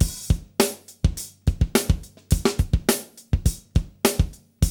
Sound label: D3 BREAK